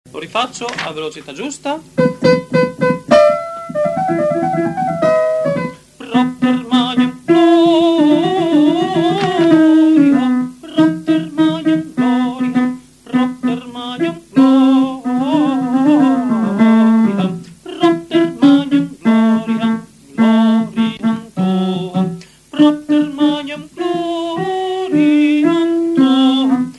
Propter magnam gloriam Tuam Tenori; Vivaldi

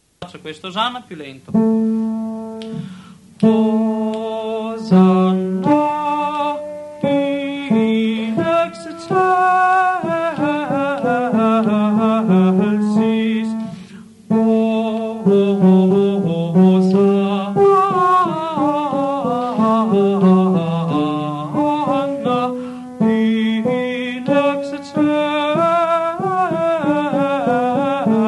Sanctus tenori; Mozart

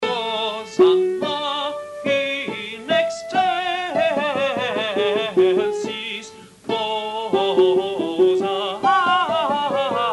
Sanctus soprani; Mozart

